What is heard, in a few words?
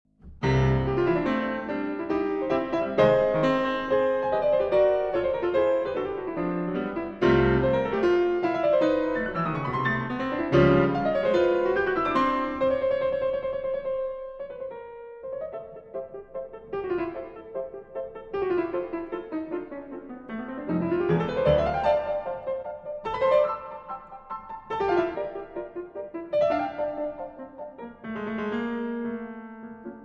Piano Sonata in C Major, Allegro con brio, ma non troppo presto